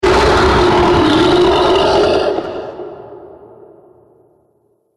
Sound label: Scream Huge Monster, Monster Sound Effects